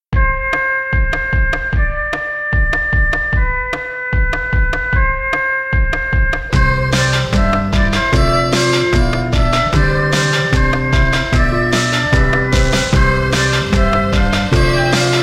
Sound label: walking wolf